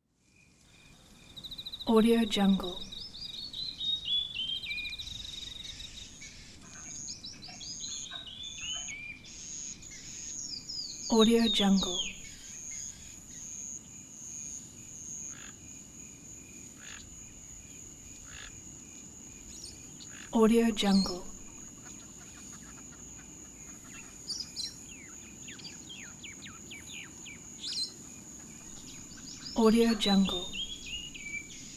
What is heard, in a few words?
jungle ambience